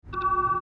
alert, computer

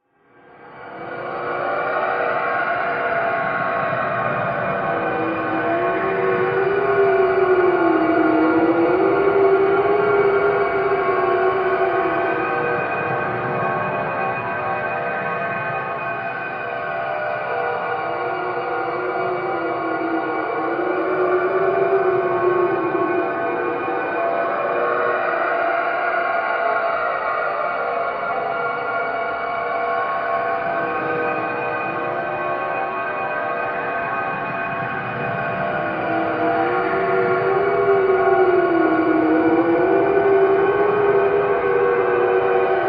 planet wind with howl, background; TOS

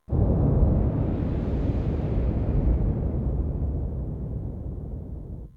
flyby; TNG

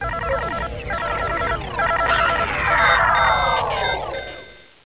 power loss; TNG

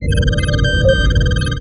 ambient bridge, computer; Ambient Bridge 3

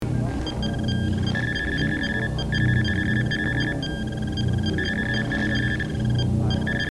engineering clean, computer

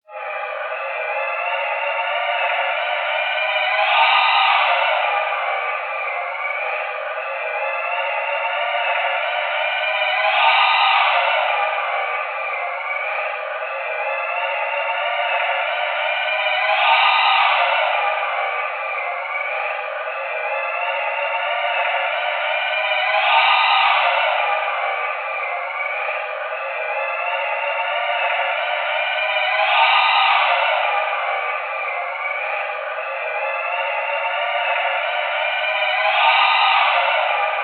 planet, background; TOS; Halkan

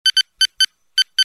ops button, computer

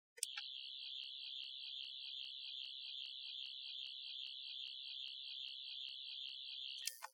engineering device; TNG

